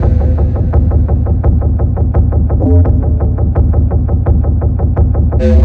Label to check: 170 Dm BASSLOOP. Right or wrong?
Right